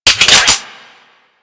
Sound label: Magnum Reload Complete, Weapons; Warframe; Tenno